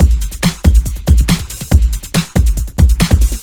Loops BB 140, BreakBeats; Vengeance Samples

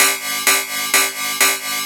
VFX2 Loop 128 BPM

